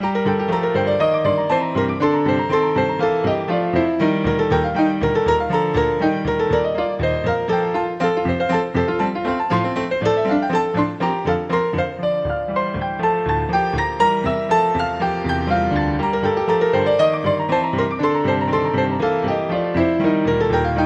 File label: Prelude in D minor for Three Pianos